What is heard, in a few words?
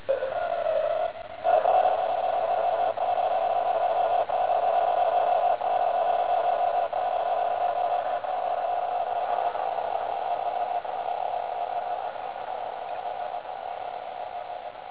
High speed CW; VHF; UZ2FWA; MS